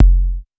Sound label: Bassdrum; Vengeance Samples; VEE Bassdrums